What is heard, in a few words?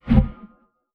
Transition Whoosh